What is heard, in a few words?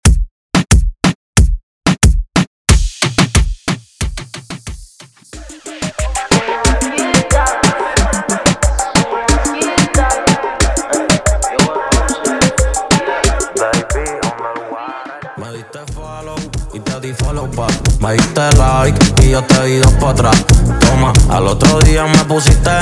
intro 91 bpm